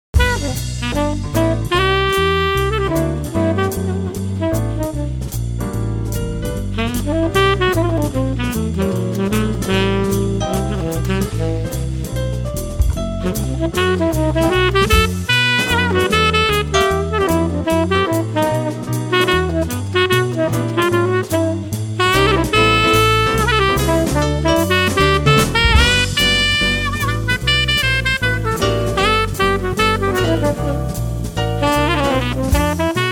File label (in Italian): Jazz fast quartetto sax